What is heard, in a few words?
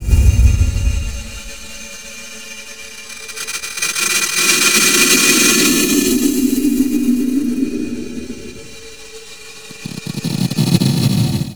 SPACE NOISE; Big Beat Samples & More; AKAI